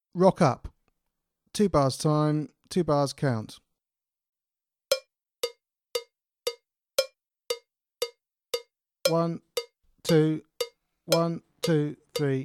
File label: Rock Up, drum kit